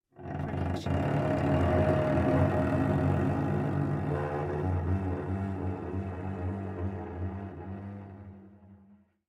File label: Cello Scary Movie; SoundsCrate